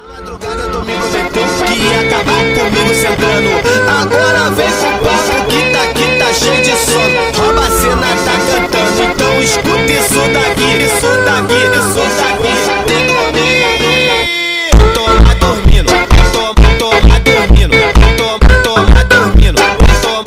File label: horror funk